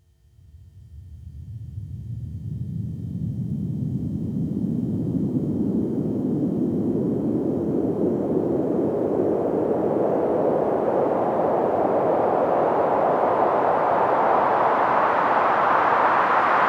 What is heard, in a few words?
Sweeps Long